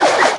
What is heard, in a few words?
Tap Tricks; subway surfers city sound effects; Android, iOS